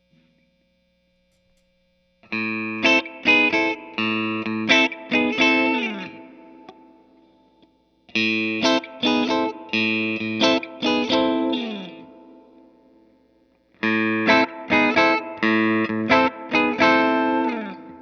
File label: G&L S-500 toggle on; Greenburst